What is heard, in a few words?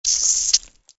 fountain pen